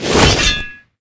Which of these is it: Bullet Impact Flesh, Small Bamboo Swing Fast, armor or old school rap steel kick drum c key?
armor